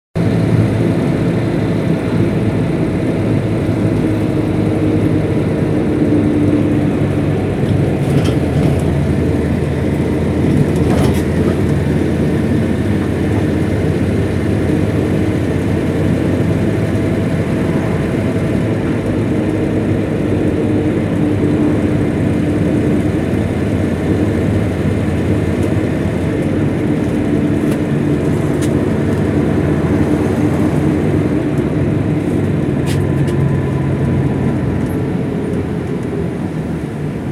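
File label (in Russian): звук автобуса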